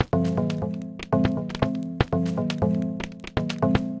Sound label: Recycle Bin Kit; Drum Racks; Ableton; Factory Packs; Lost and Found